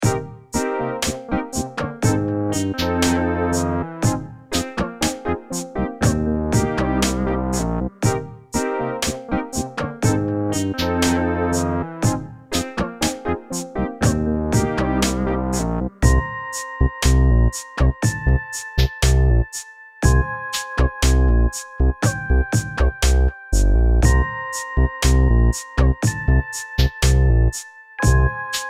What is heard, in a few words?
beat